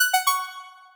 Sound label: notification; fluffychat